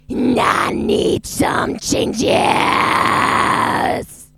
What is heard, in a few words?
Grunt Distortion Phrase